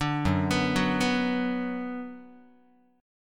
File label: F sharp Suspended 4th Sharp 5th Guitar, chord; arpeggiated; F#sus4#5